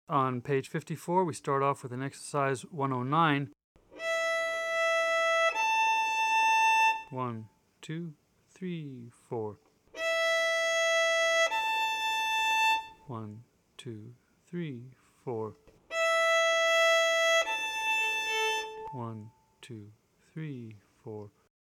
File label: Exercise The A Note